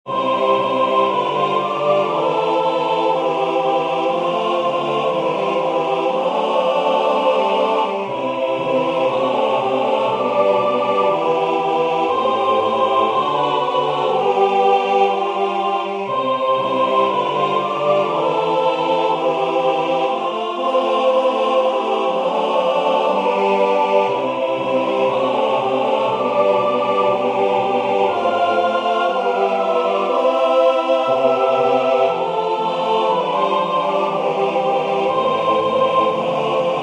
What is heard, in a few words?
Hymne au soleil Tutti; Concert du 13 décembre 2024 en l'Église St Pierre - St Paul